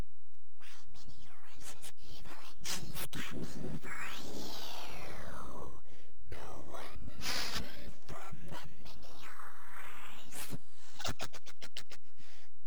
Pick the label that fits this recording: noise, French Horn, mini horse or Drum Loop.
mini horse